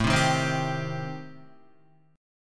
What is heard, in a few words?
A-Minor 7th Flat 5th, Guitar, chord; strummed; JGuitar